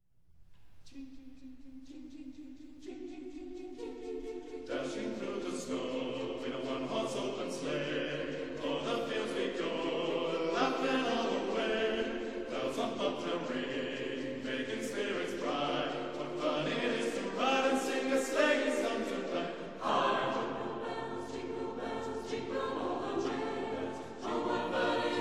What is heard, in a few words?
Jingle